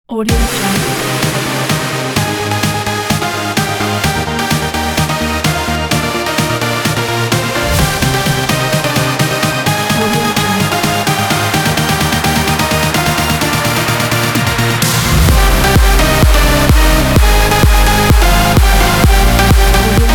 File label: energetic dance